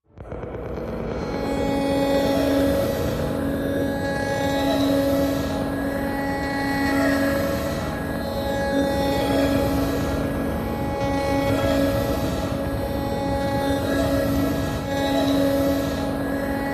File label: Alien Call Machine, alarms